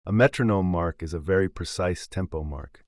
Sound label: metronome